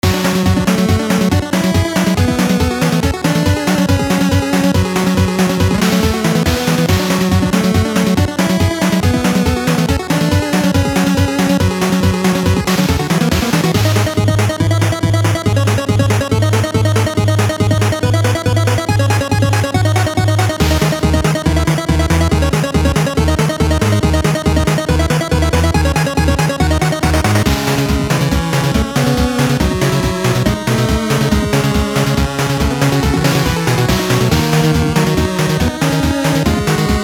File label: 8-bit last mission loop